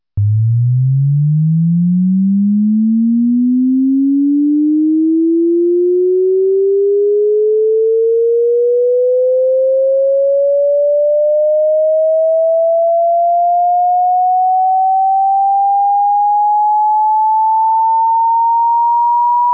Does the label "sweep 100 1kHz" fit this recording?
Yes